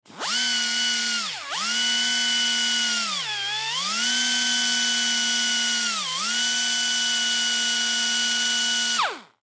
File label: Sander Electric Grind Metal Hard; Sanders; Industry; Blastwave